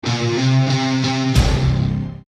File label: Guitar